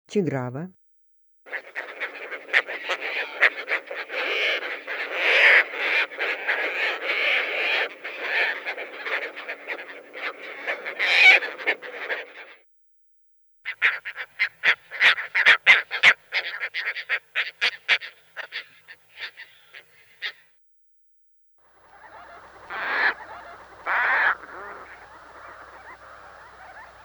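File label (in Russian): chegrava golos, Caspian tern voice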